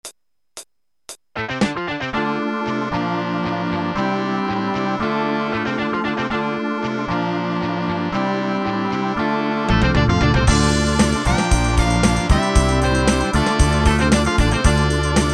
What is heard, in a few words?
Synth